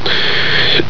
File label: hicup